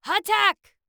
Attack

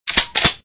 Reload Sound; Extra Lives

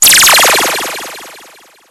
Power Down; A Dance of Fire and Ice

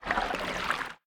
Footsteps Dwarf water; We Are the Dwarves

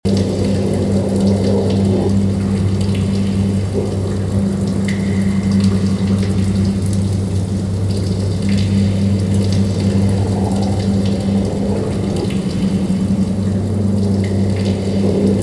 Cave Background Sound; Ezra The Stranger; By Tatreal

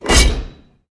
Locked Equipment

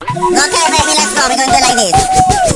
Battle Mode Stage Start

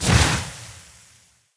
Toxic rain Impact with poison; Hero Asra

